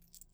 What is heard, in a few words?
coin drag stop; Papers Please